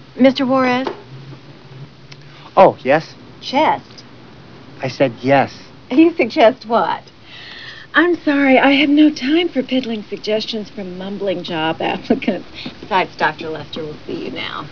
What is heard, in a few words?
chest